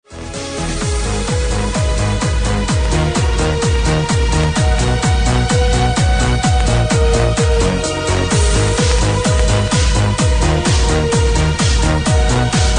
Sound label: beat